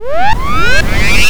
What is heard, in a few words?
se big; 东方Project; th16